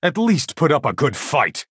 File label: attack